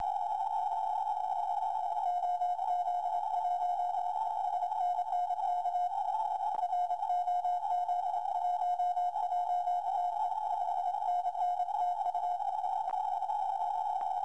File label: 80m CW; Bermuda; VP9-N1SNB